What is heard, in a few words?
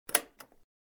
Screen Unlock; Balmuda